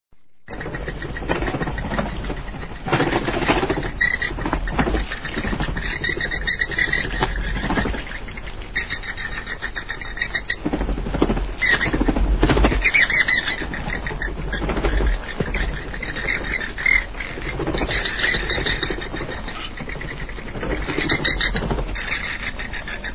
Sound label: Sound Effect - Bats